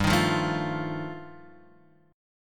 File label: G 7th Suspended 2nd Sharp 5th G chord, Guitar Open F; strummed; JGuitar